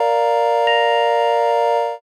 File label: church